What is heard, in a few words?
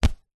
clothing thick jacket punch sweetener; zapsplat